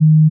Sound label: E flat 3, pure tones